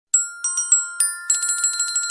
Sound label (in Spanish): Campana